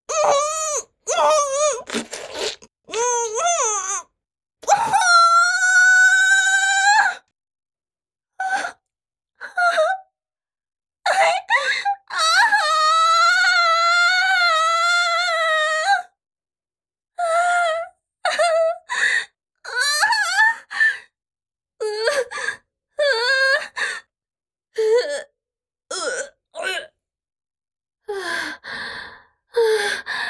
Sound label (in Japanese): 口内射精→失禁, チュパ音